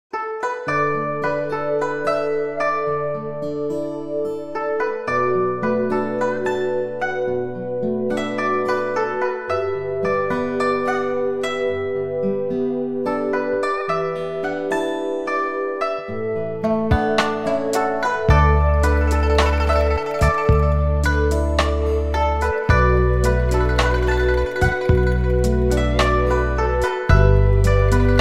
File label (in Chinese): 欢快中国风背景音乐; 古典国风新年喜庆类型音效-视频背景音乐